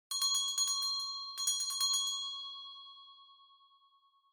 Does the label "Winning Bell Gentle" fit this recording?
Yes